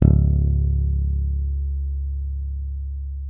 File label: C#1, FINGER MUTE; Xsample VOL-1